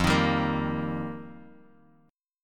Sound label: F 9th Suspended 4th, Bass Standard; strummed; JGuitar